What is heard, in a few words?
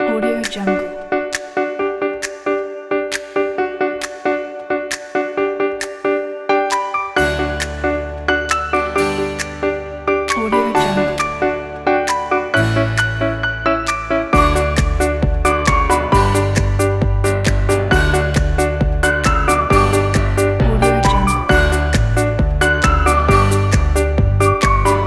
happy for kids